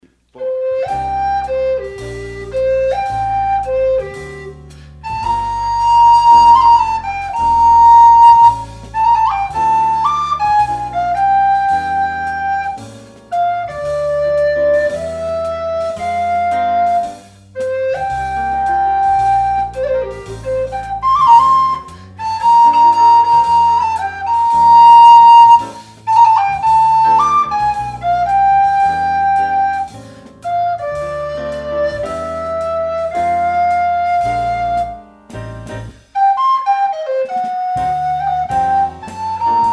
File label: Alto, recorders; Ehlert